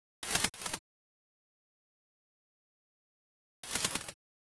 In Flight Birds

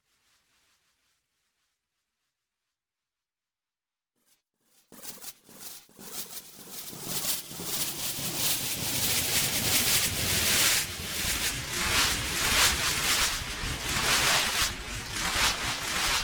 In Flight Sparse to Thick

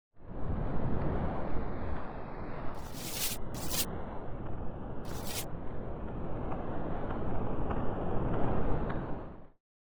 Noisy Swirls

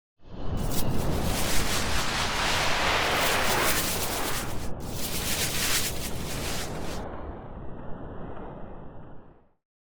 Noisy Swirls

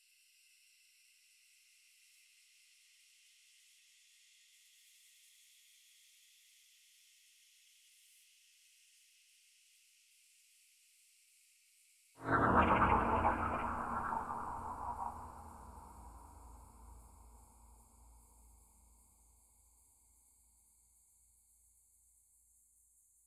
crunch strata

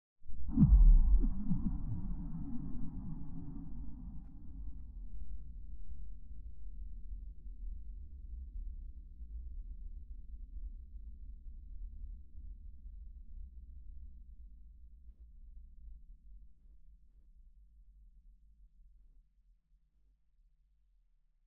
crunch woomph

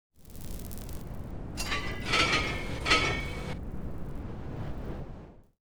crunch zones 3D

